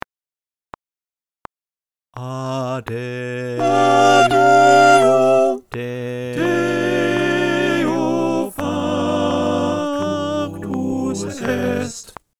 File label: sop Click